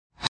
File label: Roll Over Box; scoobysnackmachine